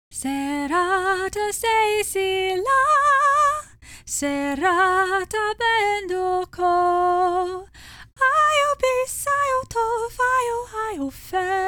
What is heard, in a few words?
sing